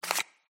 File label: card slide